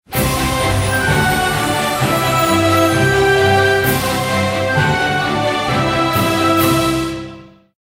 WIN MAX; Lovely Mermaid

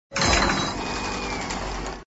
buff close; Thunder Fighter

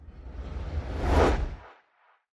freegames enter; Great Book Of Magic; Wazdan

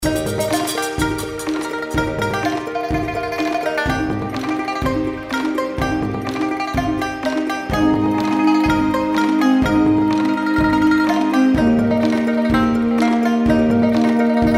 outro bgm; Formosan Birds

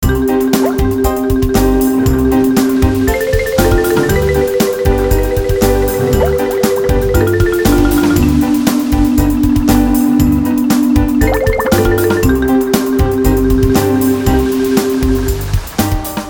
free spin melody; Mermaids Gold

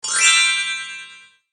freespin end; Dragons Gift; slot

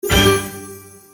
stop bonus; games; Beauty Fairy

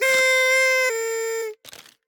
win trumpet; Hot Party Deluxe; Wazdan

